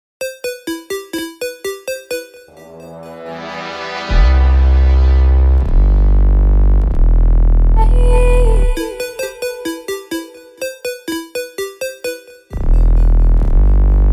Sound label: Dubstep